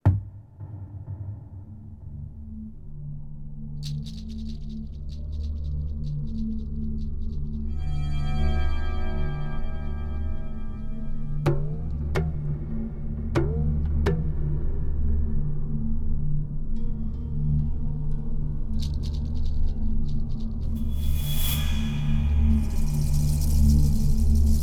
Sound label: Amb Outdoor